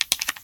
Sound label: confirm sound